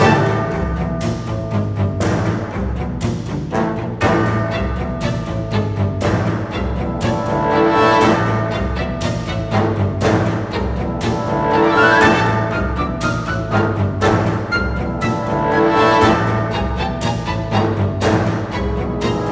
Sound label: Emregency 4-4; Mistover